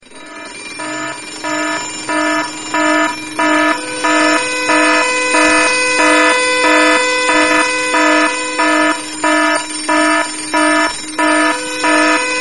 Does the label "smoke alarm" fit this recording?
Yes